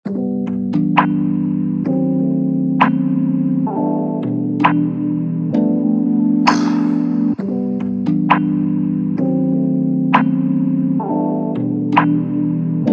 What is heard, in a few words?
songstarter 131 G, Pop; Slate; SD PASADENA Pop Sample Pack